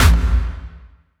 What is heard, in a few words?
Slam; Sonic Foundry (Sony Creative Software); Afterhours EDM; Session 10 - Come In Hard